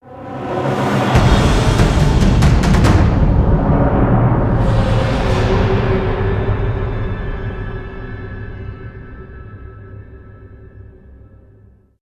Combat Intro

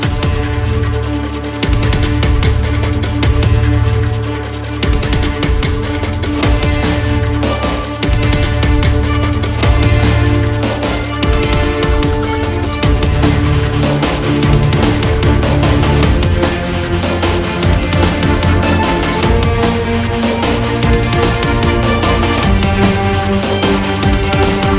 Epic Battle Looped

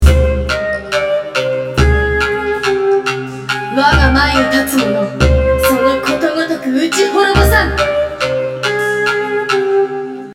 Boss Stage; Rina Rhythmerror

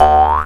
boing; Birthday Party Bash; Wii; water balloon

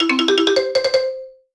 MUSIC EFFECT Solo Xylophone Positive; startide macos switch windows gamerip 2017

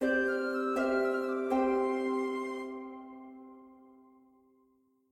chimes flute harp; gamerip; the hardest dungeon

